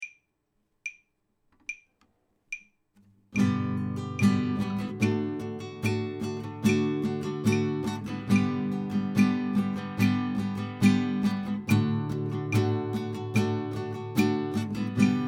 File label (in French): duo mozart guitare; basson